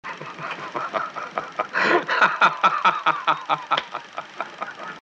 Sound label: laughter